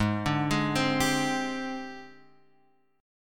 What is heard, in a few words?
Ab Major 7th Flat 5th Ab, down; arpeggiated; JGuitar